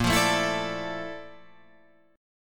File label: A sharp Major Sharp 11th